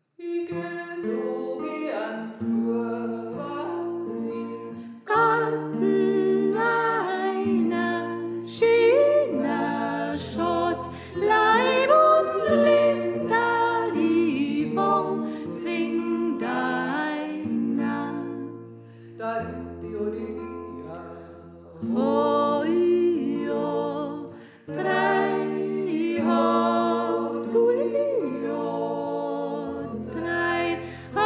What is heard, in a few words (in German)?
Sopran